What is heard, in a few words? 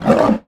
african lion cry